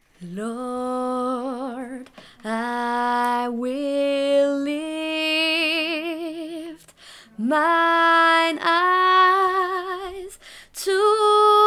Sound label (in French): chant; Rencontres